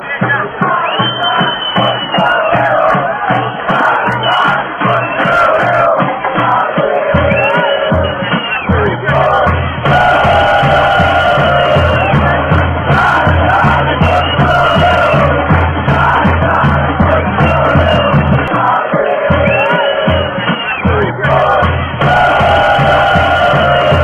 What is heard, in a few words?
fanchants